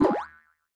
Boost Player

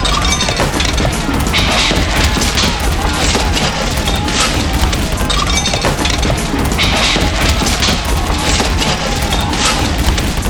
Fight; mahouteq

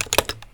UI Comment Type

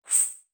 SFX - Change Player; uno

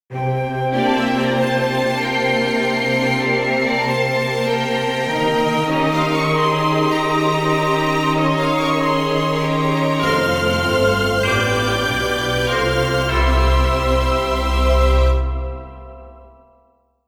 Stormy Mountain

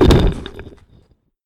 Stone Hit

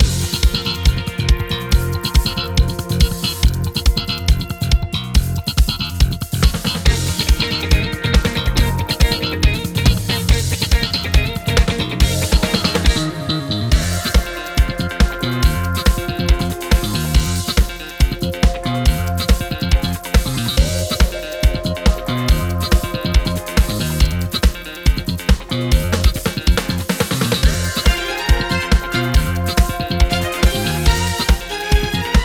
Dungeon

